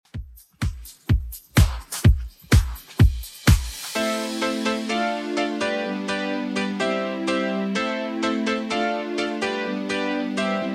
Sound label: 90s Redrum 126bpm